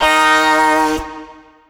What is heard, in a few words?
Omnivox oneshot Eb, Vocal; One Shots; Slate Digital Omnivox Vocal Sample Pack